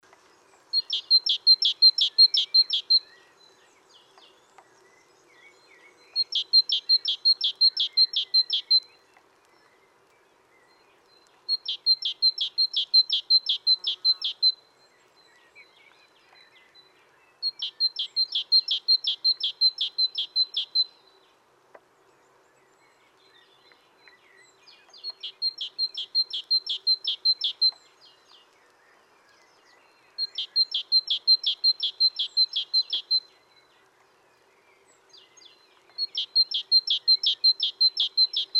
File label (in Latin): Parus major; SETE